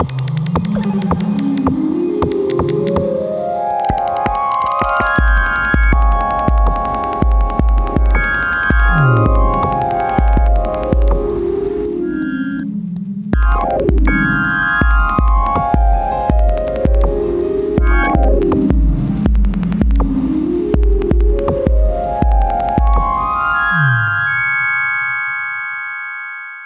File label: Whimsical